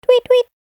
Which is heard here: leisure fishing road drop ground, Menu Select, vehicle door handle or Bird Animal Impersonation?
Bird Animal Impersonation